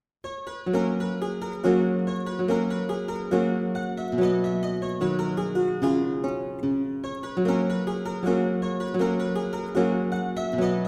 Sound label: German lautenwerck; Bassa Imperiale; Regina Clara